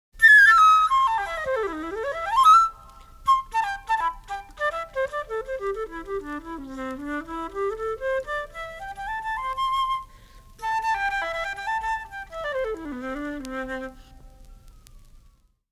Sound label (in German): Musikeffekte Floete